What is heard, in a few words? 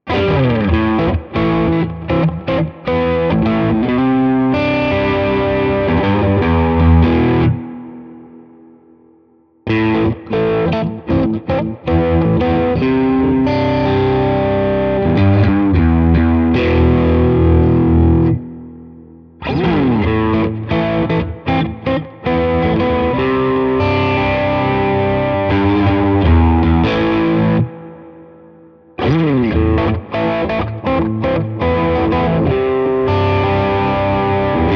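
mid gain riff all positions, guitar; GTRS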